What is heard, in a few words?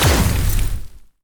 laser; spacemap; npcLasers